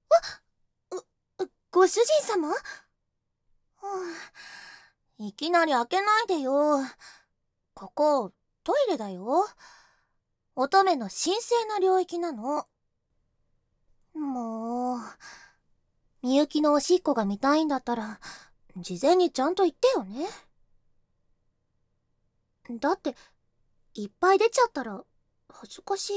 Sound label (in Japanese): おしっこパート; 3人のお屋敷メイドの週末おま○こ奉仕; 深雪; seなし差分